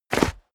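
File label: step dress boots concrete grit jump, footsteps; schritte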